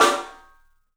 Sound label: PIC SNR L, PICOLO ROOM, 3D Drums; 3D Sound